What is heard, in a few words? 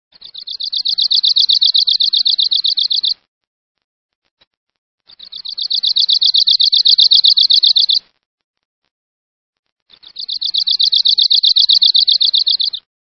Arctic Warbler